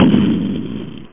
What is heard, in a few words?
brick crashes; tritus